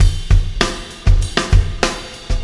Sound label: Landing Party Beat, Processed Drumkits